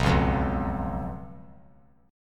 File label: B Suspended 2nd Flat 5th B; strummed; JGuitar